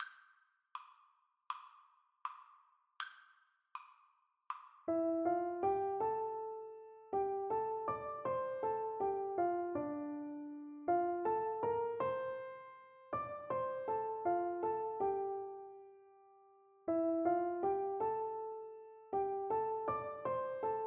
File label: piano duet